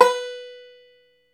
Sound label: FLATTOP, Flat Top Banjo